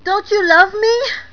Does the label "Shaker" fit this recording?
No